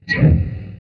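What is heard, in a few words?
CPU SCRATCH; Spectrasonic Distorted Reality 2; NASTYFUZZ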